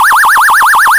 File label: Alien Small; Asteroids